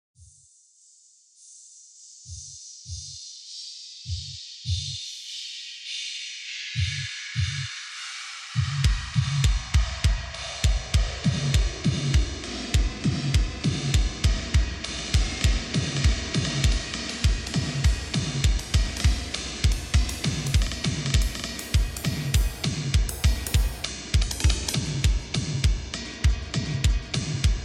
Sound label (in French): Concours percussif